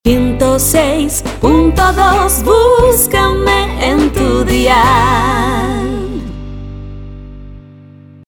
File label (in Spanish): cantante dial cantado jingle, Dial cantado : 106 punto dos.